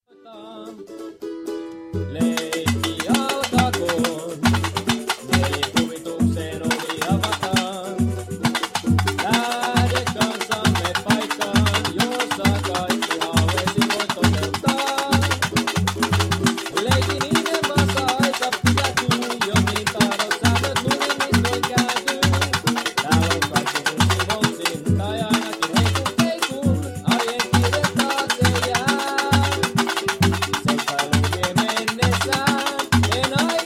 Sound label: tamborim